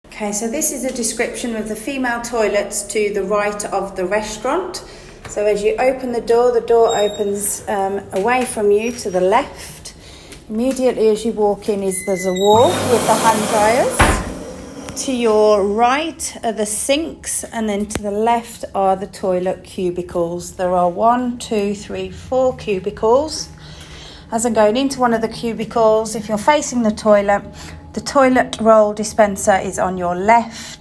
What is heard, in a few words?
Female toilets near the bar; Conference venue